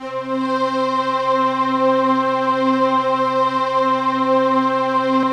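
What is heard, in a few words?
Orchestral Choir Stack; Optical Media International; Sonic Images Library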